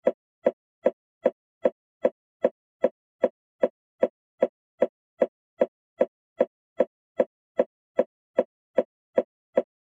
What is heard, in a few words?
Heart Monitor; Infant / Fetal 1; Infant EKG Heart Monitor; Regular Repeating Thud With Beep, Medium Perspective. Hospital.